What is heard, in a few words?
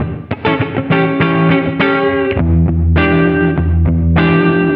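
distorted guitar; USB Soundscan vol.31; Miro s Grooves; AKAI; DISTO GTR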